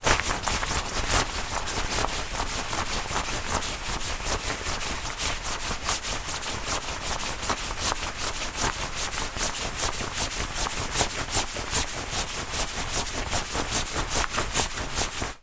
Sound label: coat jacket arm movement running